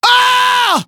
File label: pain; awesomenauts